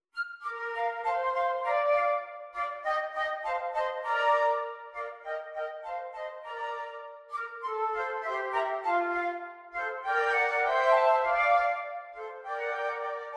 Flute trio; Allegro; mozart

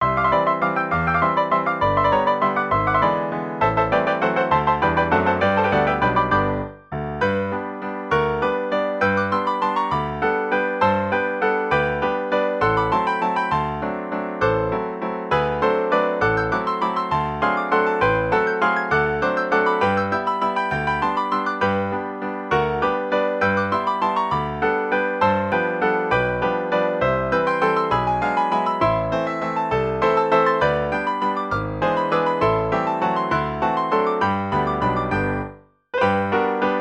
6 Hands 1 Piano; Over The Waves; Juventino Rosas

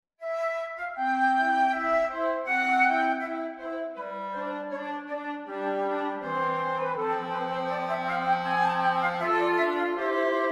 Woodwind Trio, 2 flutes; Taminos Aria

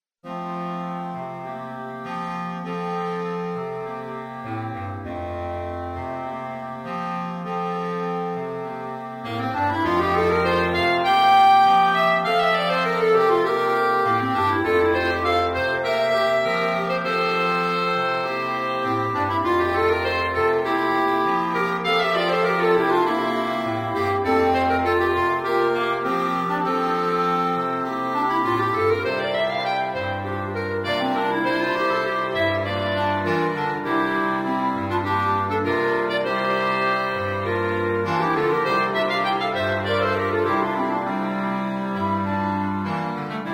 Clarinet QUINTET or Choir

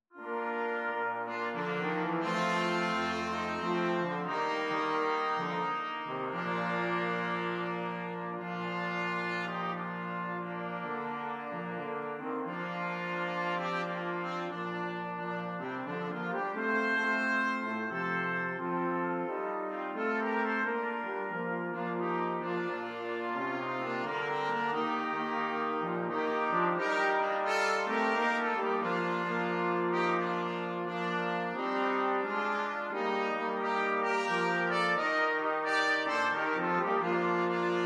Jazz brass, brass quartet; Silent Night

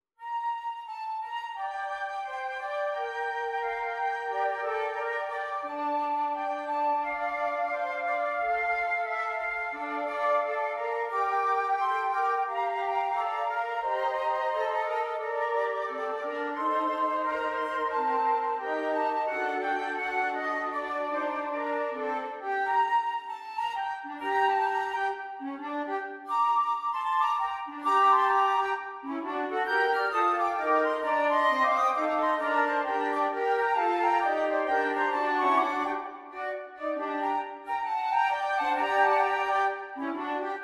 flute, a jazz arrangement for flute quartet; Carol of the Bells

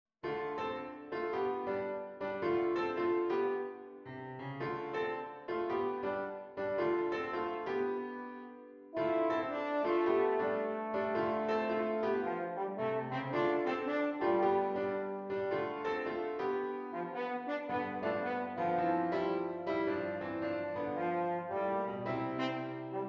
French Horn

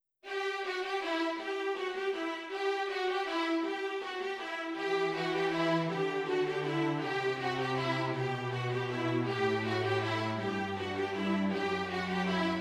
String TRIO

